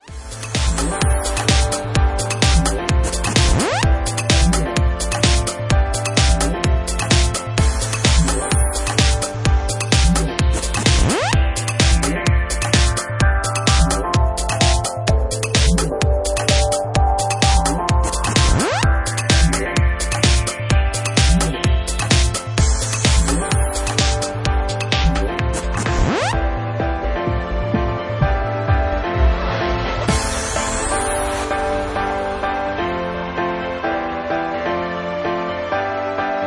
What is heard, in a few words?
Electro Mix